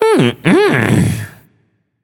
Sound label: disagree, voicepack; smite; jorm